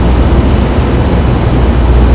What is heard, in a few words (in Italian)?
Veicolo, motori